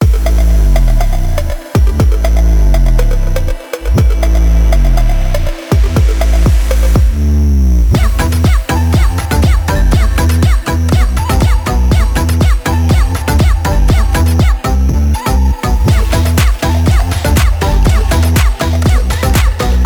brazilian phonk music

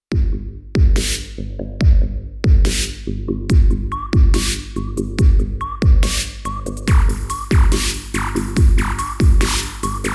industrial

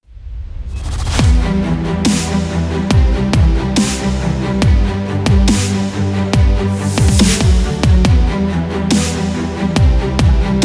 epic hybrid cinematic dubstep

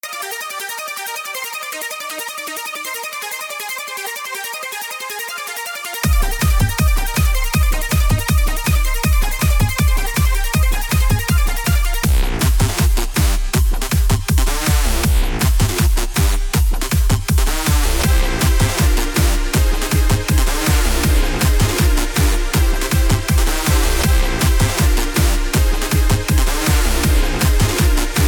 synthetiseur fire

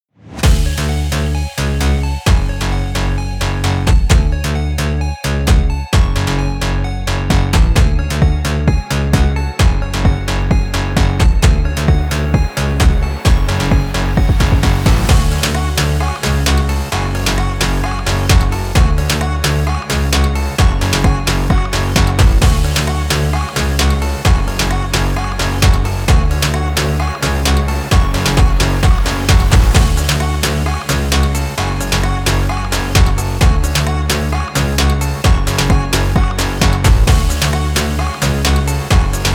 phonk music

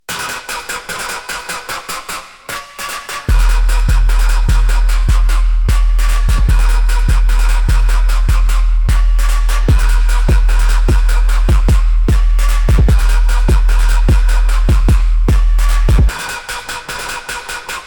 bass

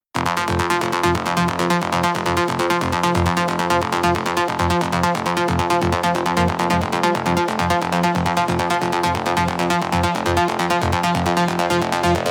synths

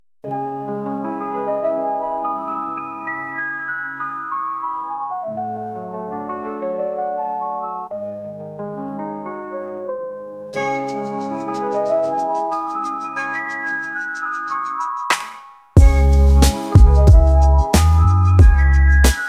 trap

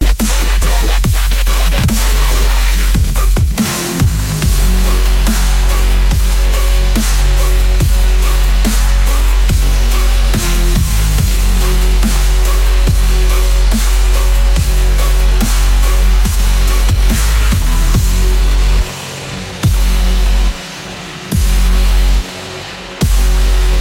electric rhythm

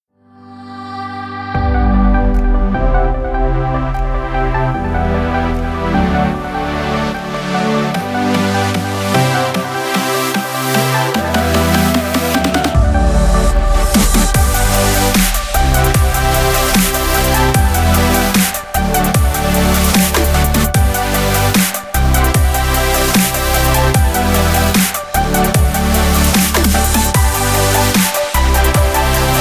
summer inspiring dubstep

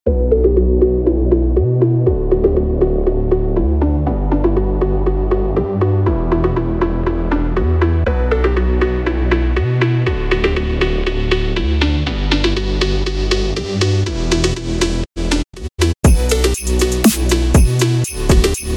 phonk soundtrack

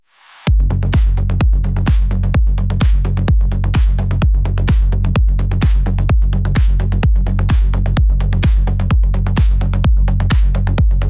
bass house bounce bass

